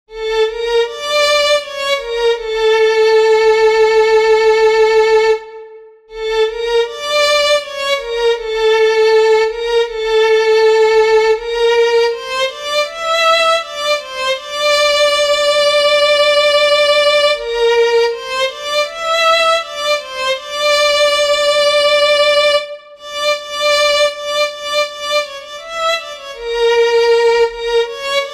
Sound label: For Violin; Mokhtarnameh